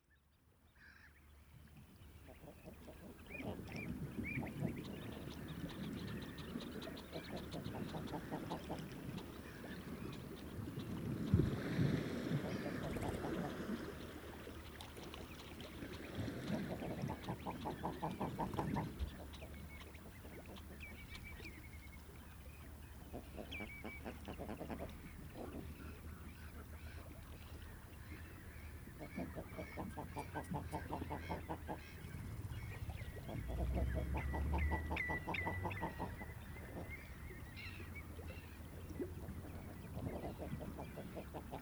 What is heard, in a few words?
Raufarhöfn, Time for fishing; Raufarhöfn, a small village in north east of Iceland, close to the arctic circle